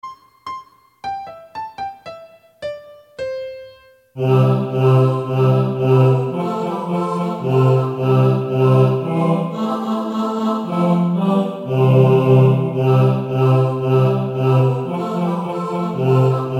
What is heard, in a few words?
BASS